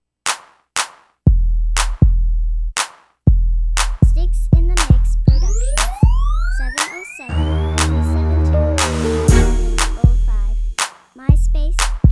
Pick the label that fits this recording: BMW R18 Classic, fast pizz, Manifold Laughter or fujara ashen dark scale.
fast pizz